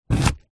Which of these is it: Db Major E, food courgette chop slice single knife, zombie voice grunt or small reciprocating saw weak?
food courgette chop slice single knife